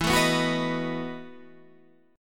E Major 7th Suspended 2nd Suspended 4th, Guitar, EM7sus2sus4 chord; strummed